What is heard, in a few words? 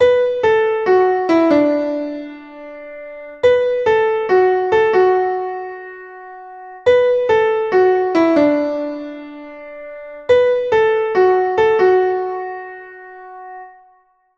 Piano